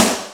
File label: OUCH-SNR; Rubber Chicken Software Sample Bank; DRUMS + PERC